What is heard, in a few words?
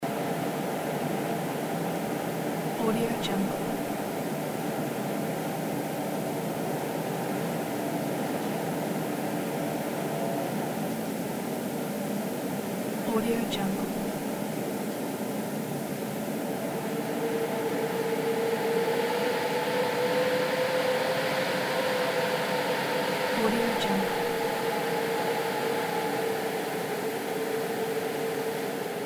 wind rustles through the cracks